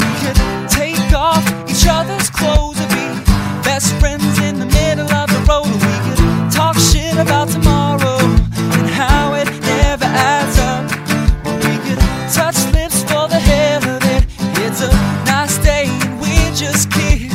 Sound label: Sidewalk Sing Along